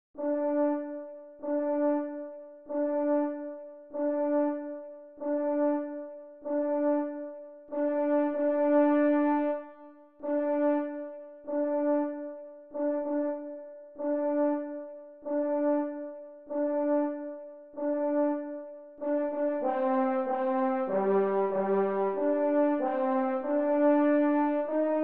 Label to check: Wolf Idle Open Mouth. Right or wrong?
Wrong